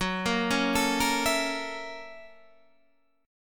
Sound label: Gb 7th Sharp 9th Gb Guitar Standard; arpeggiated; Gb7#9; 14,13,11,14,11,12; JGuitar